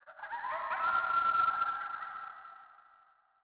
sting coyote; Rust

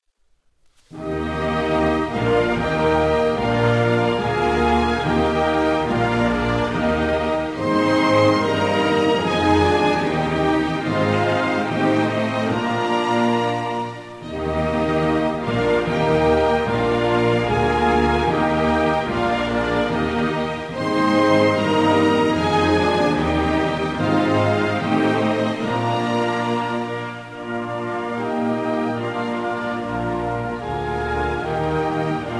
Music; DCS

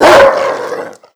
animal dog bark

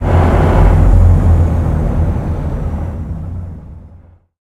t90a engine int load, tanks; engines; War Thunder

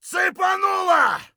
hurt light; Escape from Tarkov

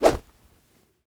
bone club attack; Rust

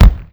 Explosions Explode Ground; DCS

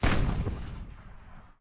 aa fire small, guns; War Thunder

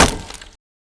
Wood Shield Hit; Civ4 warlords

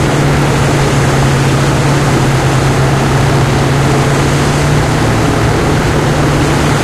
su 100 engine int rpm 99, tanks; War Thunder

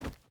footstep boots forest run; Rust

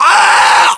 death, voiceovers

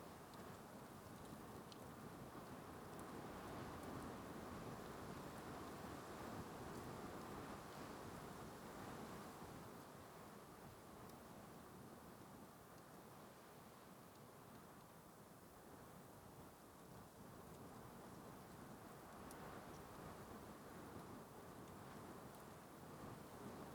ambience field breeze; Rust

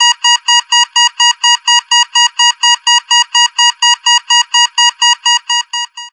alarm altitude

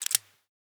python open cylinder; Rust

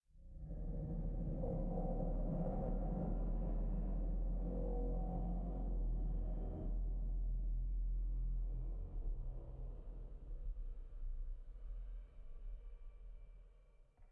sting metal groan; Rust

